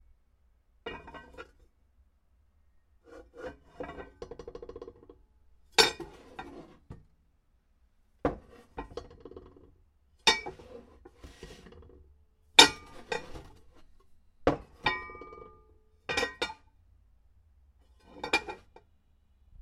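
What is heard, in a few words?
large aluminium pot being processed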